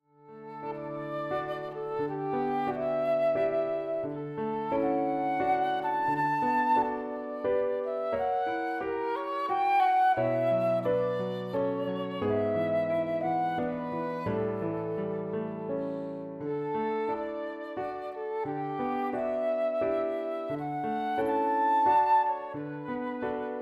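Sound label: Flute and Piano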